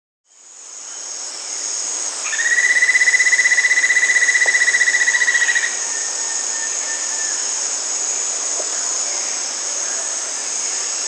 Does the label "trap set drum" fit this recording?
No